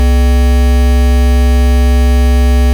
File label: bzzzt; 96kHz wave file; SRC presentation